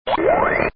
Robo Walk

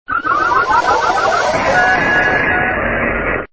Dying

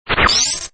Ice Beam

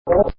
Enemy Hurt

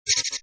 fish damaged

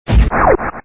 Spazer

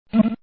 Enemy Hurt

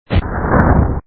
Missile

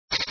Fish Hurt

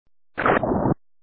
Splash

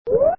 Bloop

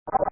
Enemy Hurt

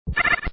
Enemy Dive

